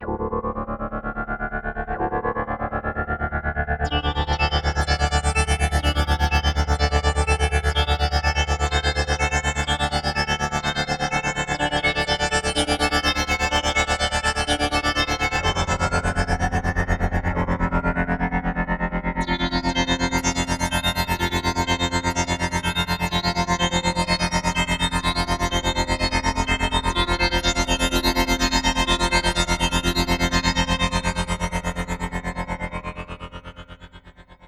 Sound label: Stutter Rounds